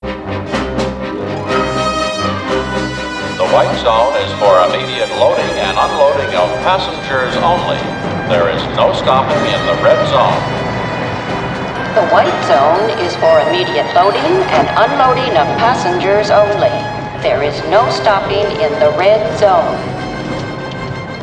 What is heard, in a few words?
airplane